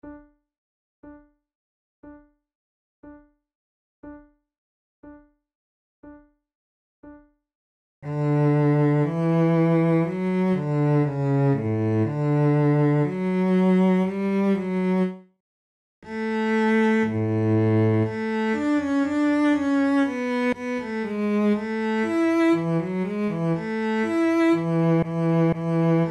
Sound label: flute sonata adagio